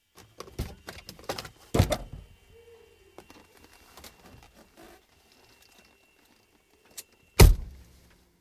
Cessna C150, interior, door opened & closed (Light aircraft, single piston engine); Airplane Sound Effects; BBC